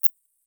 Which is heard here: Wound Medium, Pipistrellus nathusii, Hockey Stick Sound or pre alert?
Pipistrellus nathusii